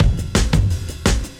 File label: funky, drum loops